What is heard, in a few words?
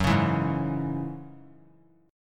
F sharp 7th Suspended 2nd; F#7sus2 strummed